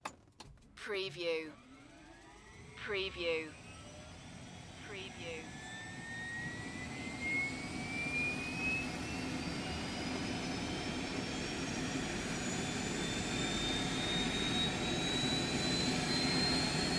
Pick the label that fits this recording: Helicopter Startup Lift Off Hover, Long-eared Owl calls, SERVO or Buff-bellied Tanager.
Helicopter Startup Lift Off Hover